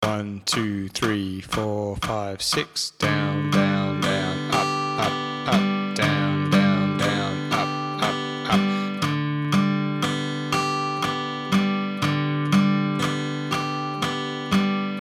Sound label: everyone feels g, guitar; arpeggios